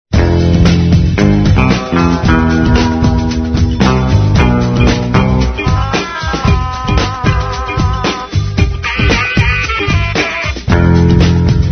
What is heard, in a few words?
Gtr Spy Guitar; A Kay Value Leader branded Old Kaftsman